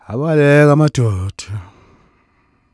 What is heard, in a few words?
Vocal Chirp, African Voices